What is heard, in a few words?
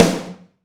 roomy acoustic snare f key